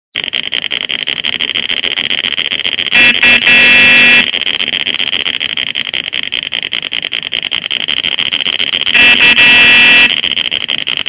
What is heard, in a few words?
diesel; Krick zvukový modul do RC modelů lodí imituje zvuk benzinového/dieselového motoru; houkačka